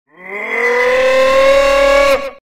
beefy scream

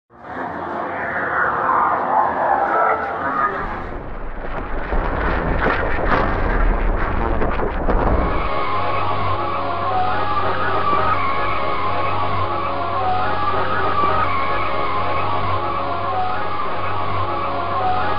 scary ambiance

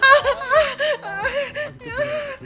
heart; gmdos

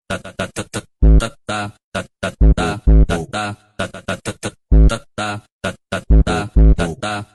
tatata funk

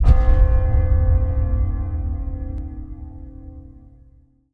restore balance bell; yook; countespell

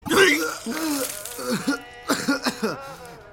puke

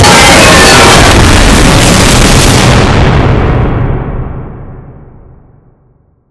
fastest earrape chewing

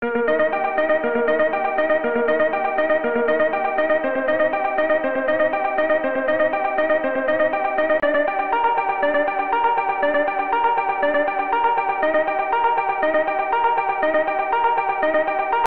melody

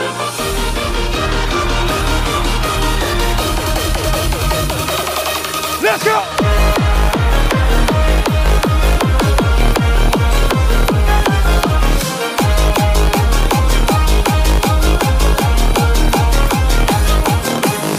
classical music

